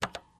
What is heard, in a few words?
Computer Keystroke, keystrokes